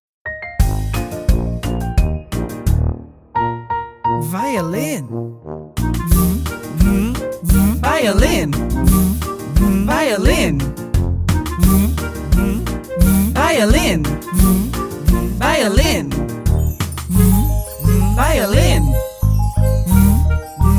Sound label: violin